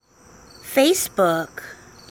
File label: Facebook Alert